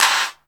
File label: EFEX, FX DRUM